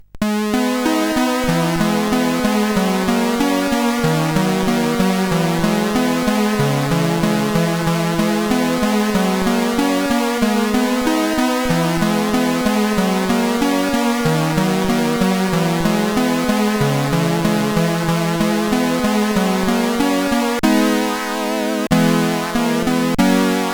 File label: Play Piano, sid CSG8580R5